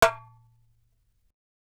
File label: darbuka note